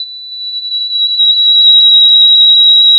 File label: pop, sound of a tea kettle whistling